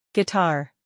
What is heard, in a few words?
guitar